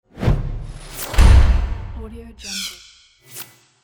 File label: industrial tech logo or graphic slam; fascinatedsound